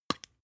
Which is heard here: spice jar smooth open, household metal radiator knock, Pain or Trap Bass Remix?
spice jar smooth open